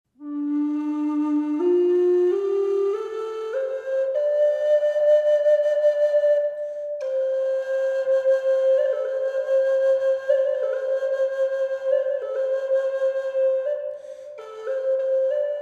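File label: reverb, flute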